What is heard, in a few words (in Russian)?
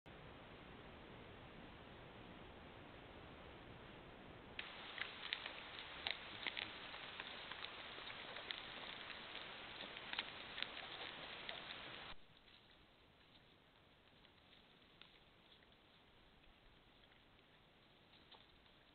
zvuki pekari